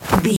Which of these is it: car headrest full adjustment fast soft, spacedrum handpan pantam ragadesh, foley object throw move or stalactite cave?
foley object throw move